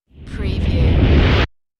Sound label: Rush